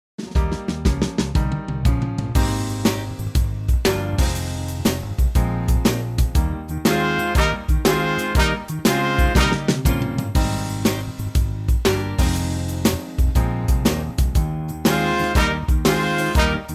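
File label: soul family E flat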